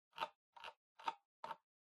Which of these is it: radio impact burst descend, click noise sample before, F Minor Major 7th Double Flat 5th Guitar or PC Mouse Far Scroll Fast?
PC Mouse Far Scroll Fast